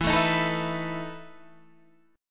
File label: E Major 7th Suspended 4th; chord shape